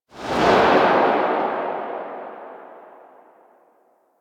Burst Rifle Tail; Weapons; Grineer

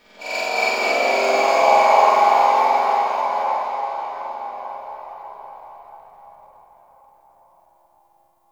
Mid Texture; Warframe; Guild

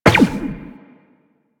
Step Zipline, Footsteps; Walk; Warframe; Tenno

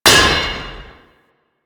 Worker Hammer Impact Metal, weapons; Grn Worker Hammer

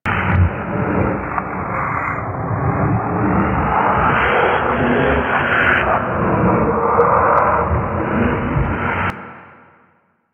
Energy Tube Loop Small; Props; Warframe; Entrati

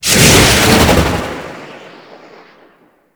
hyena dash ability, attacks; Warframe

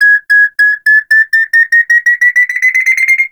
grenade warning; Enemies; Soldiers; Weapons; Warframe; Lasrian; Goonadier

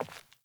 Sandals Walk Default, Footsteps; Characters; Player

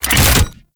Rifle Reload; Weapons; Warframe; Thanotech

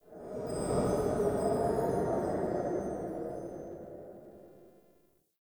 Ancient Wind; Ambience; Dungeons; Under City

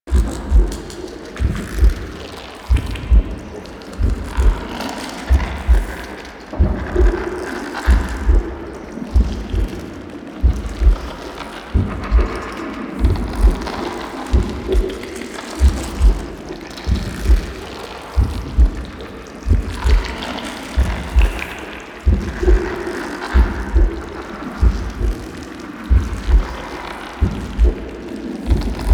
Interior Brain Loop, Ambience; Warframe; Infested Eris

